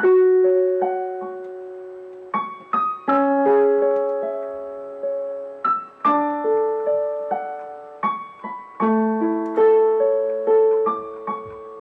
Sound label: piano, Loops Sad